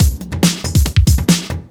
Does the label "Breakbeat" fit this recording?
Yes